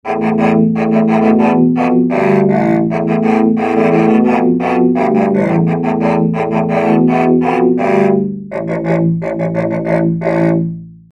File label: cello